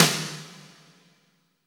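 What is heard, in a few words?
Snare, Roland; Real Snrs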